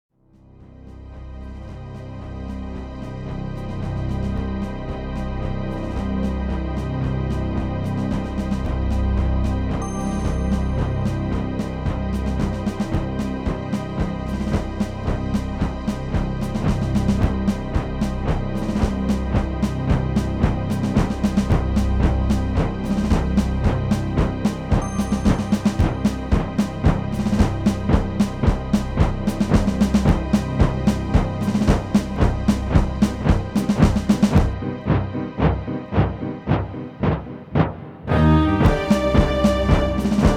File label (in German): Marsch; Marsch der Schwarzen Diener